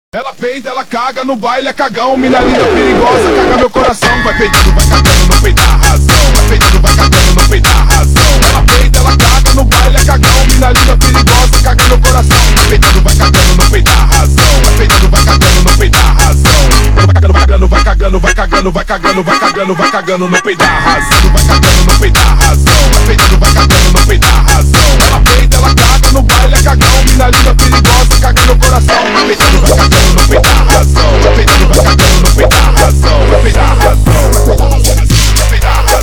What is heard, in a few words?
Tekk slowed; funk